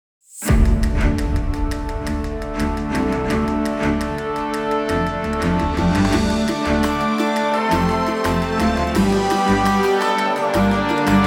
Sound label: Opening Groove; Wargroove